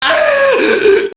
junkie get hit; enemy; Max Payne

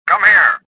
anger; Star Wars Jedi Knight II Jedi Outcast; XBOX

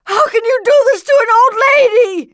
hurt; True Crime Streets Of LA; XBOX

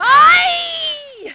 fall; Splashdown; XBOX

